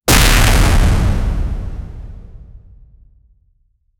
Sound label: a sudden deep visceral th, stinger